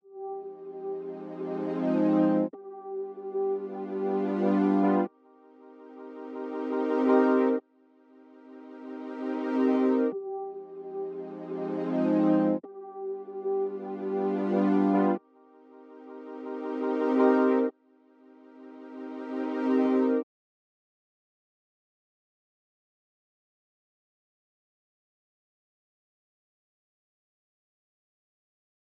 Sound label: 95 BPM C Min Prophet; RnB; Melody; Loop Stems; Cymatics; 2022 Melody Collection; Poetry In Motion